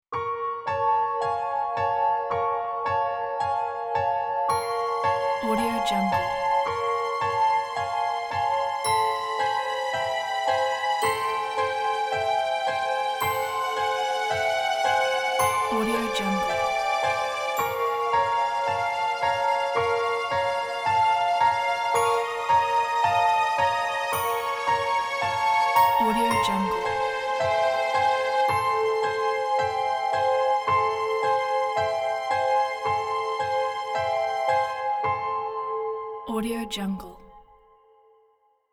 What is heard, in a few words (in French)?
musique escape game Halloween